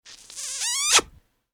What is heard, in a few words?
Long Noisy Kiss; Kissing Sound Effects; human